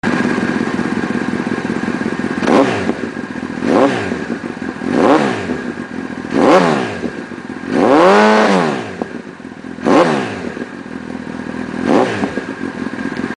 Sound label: CBR1000RR RT-1 aluminum slip on, exhaust sound; honda; Jardine; OLDSTYLE